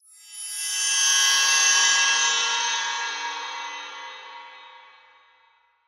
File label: home made 60s teleport